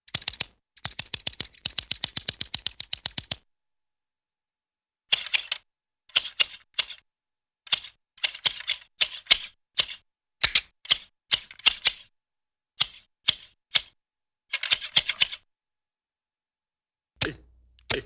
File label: Rapid Scissor Snips, cartoon; Hollywood Edge; Cartoon Trax